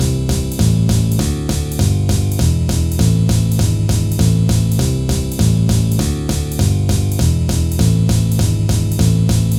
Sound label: Foxtrot